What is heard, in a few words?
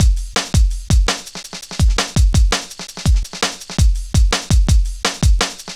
Drum Loops, Jungle, 166.5